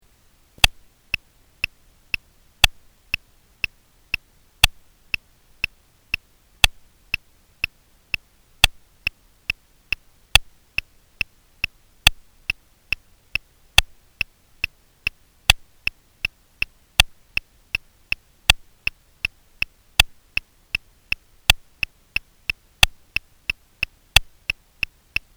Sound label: Sixteenth Notes 30bpm to 80bpm